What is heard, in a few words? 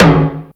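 DMX high tom, Drums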